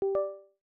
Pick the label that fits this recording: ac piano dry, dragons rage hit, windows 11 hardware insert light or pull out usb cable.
windows 11 hardware insert light